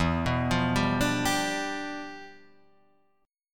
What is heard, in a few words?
E Minor 7th Flat 9th E